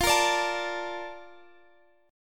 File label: Bb Major 7th, F